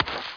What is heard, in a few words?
impact rock